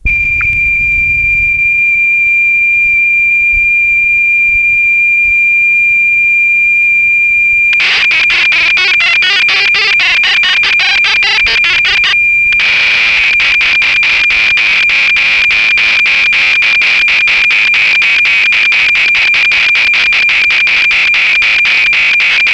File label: Oberheim DMX factory seq, Cassette Interface; Synthesizer Factory Patches